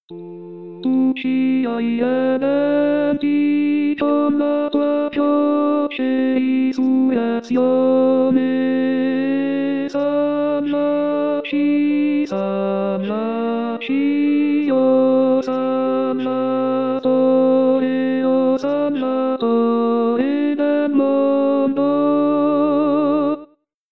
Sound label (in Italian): Tu Ci Hai Redenti Tenori; pasqua